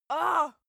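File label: female pain, screams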